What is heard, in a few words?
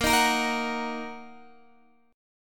Bb Suspended 4th Sharp 5th, Bb, down, Guitar, Open D